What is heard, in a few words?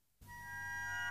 gagaku